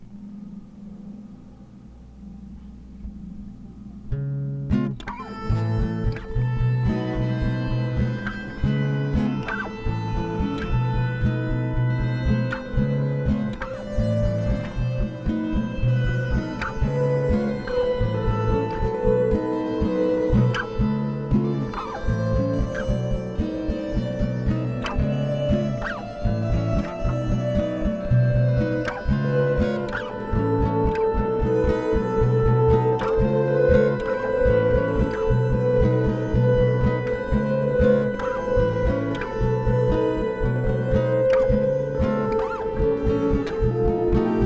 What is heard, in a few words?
guitar fun